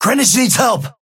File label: Synth ping green help